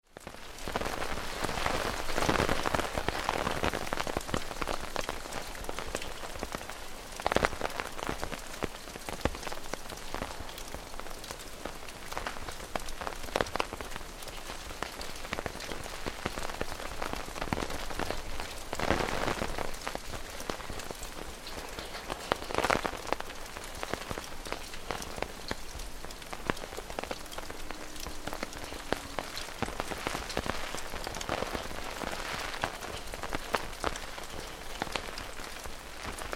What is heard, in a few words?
Rain on Umbrella, big close drips; Tom Hutchings